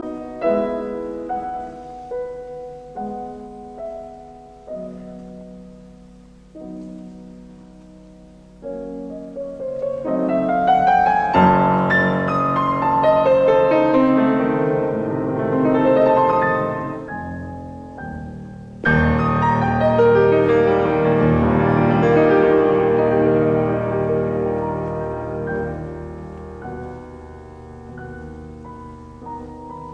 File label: Piano Sonata in E major, Vivace; Piano Sonata No 30; Op 109